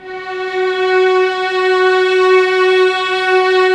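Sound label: violins; String Master Series; Vlns 6 p-mf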